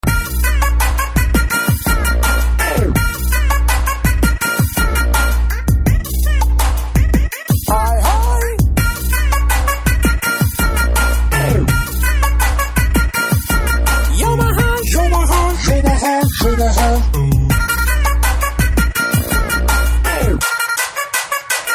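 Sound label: background music Telugu Ringtone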